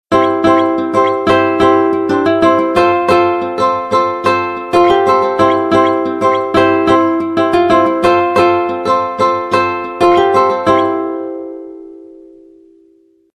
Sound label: garden waltz, ringtones; Sony Ericsson Xperia Play